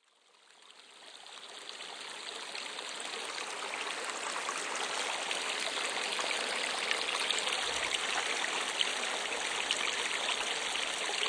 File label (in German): Am plaetschernden Bach